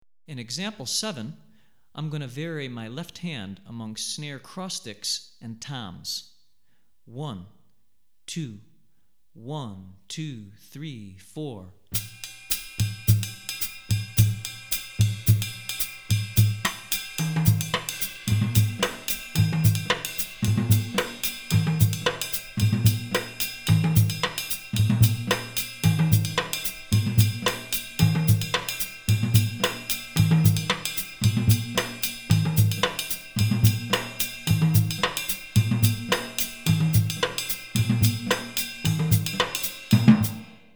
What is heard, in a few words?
Akom, Drumset Example, West African Rhythms For Drumset; Hartigan